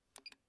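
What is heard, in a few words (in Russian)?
sound of old stopwatch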